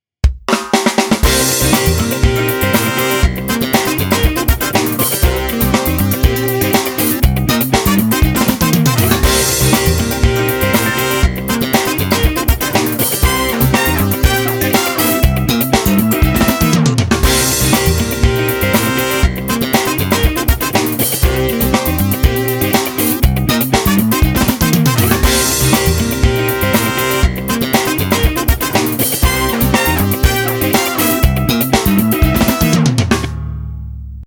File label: Funk